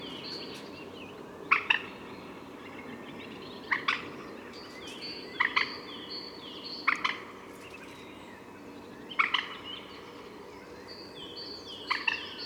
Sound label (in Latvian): ūdensvistiņa gallinula chloropus; Common Moorhen (Gallinula chloropus); putni liepajas ezera lauku iela